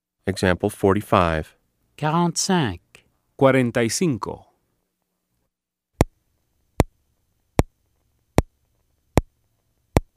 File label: Keyboard